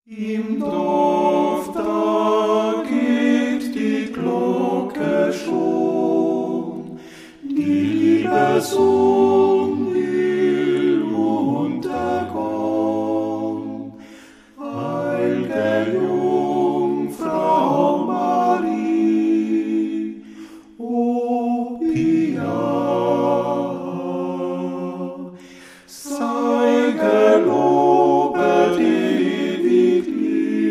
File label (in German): Im Dorf da geht die Glocke schon